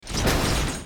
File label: turn; blackknight